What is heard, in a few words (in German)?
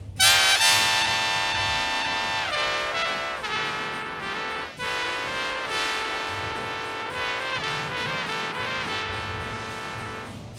Doubler Trompete Psychedelic